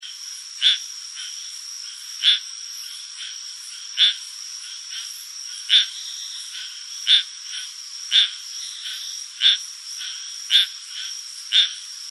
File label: Gephyromantis granulatus